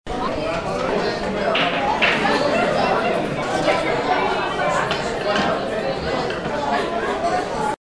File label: party billiards